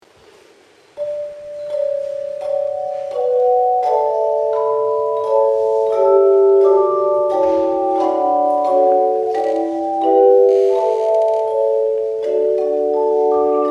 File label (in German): Glockenchor; Glockenchor-heilig